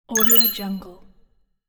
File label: electronic computer signal